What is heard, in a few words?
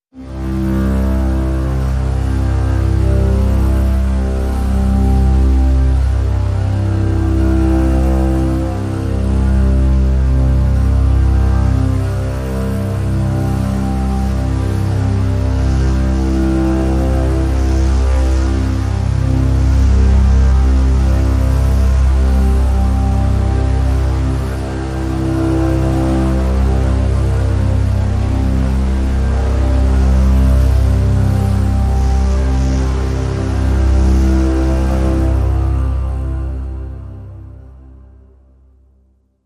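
drone bowed bass C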